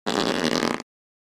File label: Rubber stretch and stress